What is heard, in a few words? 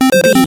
retro synth beeps groove